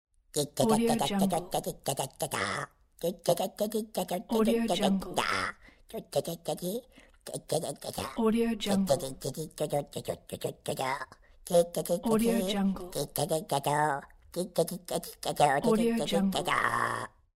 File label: funny song for the cartoon; jdproduction